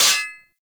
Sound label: Melee Sword Impact Generic; Weapons; Swords